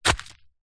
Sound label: footstep single forest path track; foley